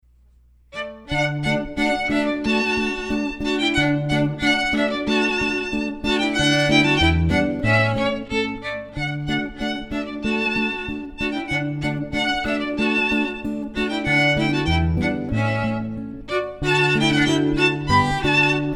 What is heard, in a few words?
trio